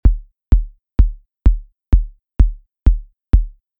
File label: kick electronic